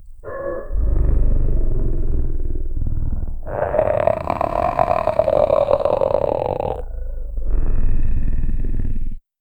werewolf attack gutural

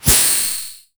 small explosion then toxi, gas

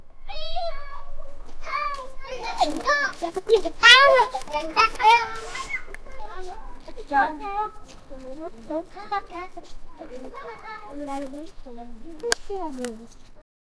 children snickering; climbing

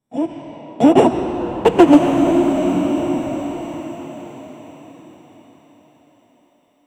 dehumanized arcane vocal, ritualistic

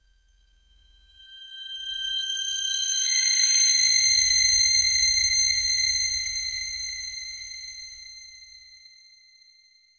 quick rune flickers and c

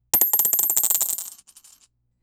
a loud sharp burst of; completion

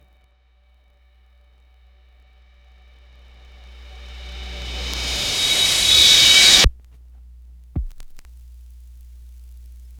a long down sweeping whoo; vinyl scratch

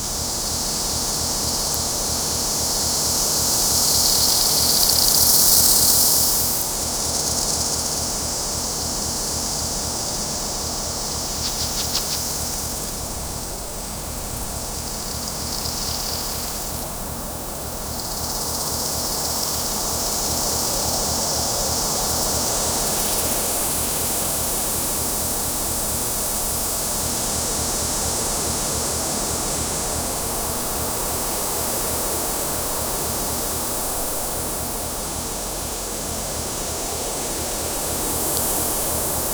mountain sound little win

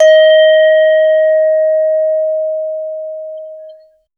a clean dry audio recordi, single note

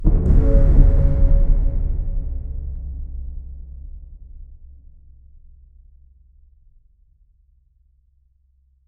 Mahlerian hammer strike, apocalyptic

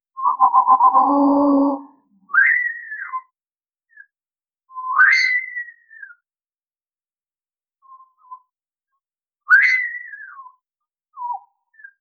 turkey like bird sound, bird call

